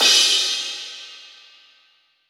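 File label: ambient 18 crash, GM drum set; AKAI S-Series CD-ROM Sound Library VOL-8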